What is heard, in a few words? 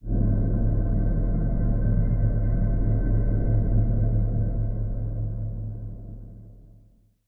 cosmic ambiance; Stellaris; astral planes